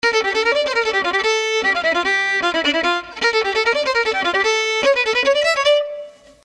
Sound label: Gypsy 4 bar 150